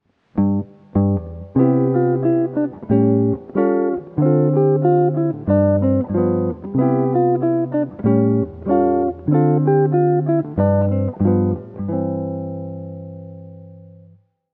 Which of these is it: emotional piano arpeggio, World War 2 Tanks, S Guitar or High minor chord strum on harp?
S Guitar